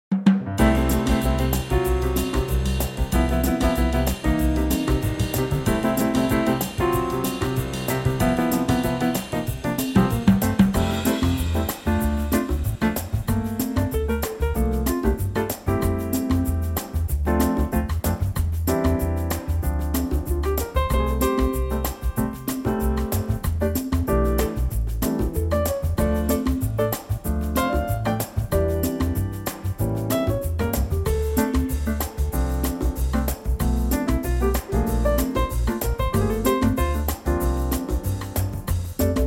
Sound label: F latin